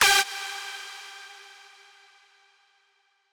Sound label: lead